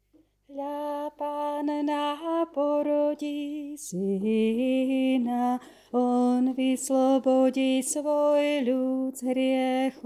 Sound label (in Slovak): Hla panna porodi syna Tenor; Vianoce 2019 - Hľa Panna porodí syna